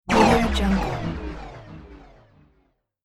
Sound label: tech future noise